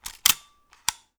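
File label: 35MM SHUTTER; DXM EarShot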